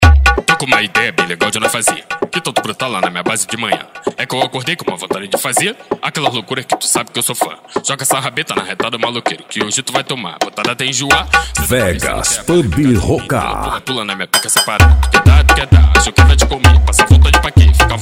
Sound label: funk mega bass